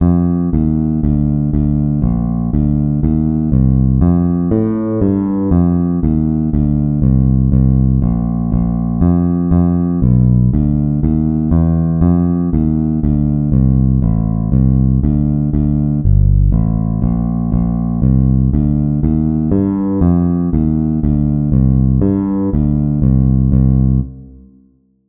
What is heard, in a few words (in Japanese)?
Fブルース パターンB例1